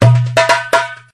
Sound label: darbuka, notifications; Sony; Xperia Z3